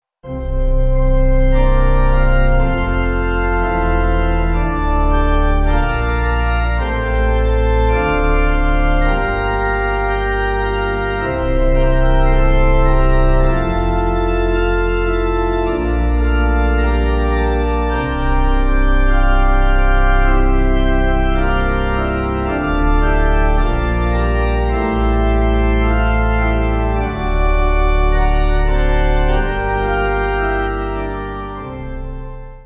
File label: What Is This Bread, Pipe